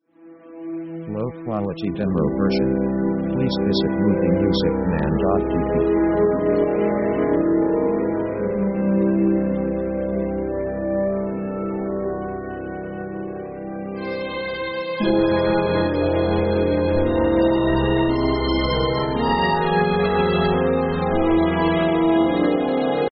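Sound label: orchestral epic magic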